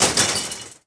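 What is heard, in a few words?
Lamp Collapse; objects; Star Wars Obi-Wan; XBOX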